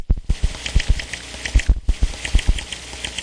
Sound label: heartbeat noise machine, LOOPING AMBIENCE